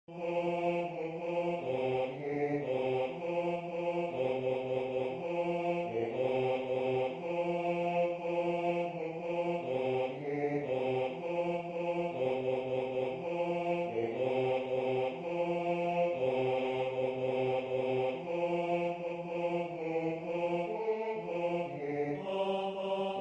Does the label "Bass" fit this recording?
Yes